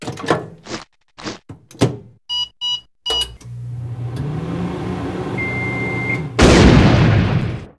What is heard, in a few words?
Cartoon Teddy Bear Microwave; SFX Kit; Foley - Misc